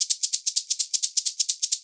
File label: SHAK, Percussion Loops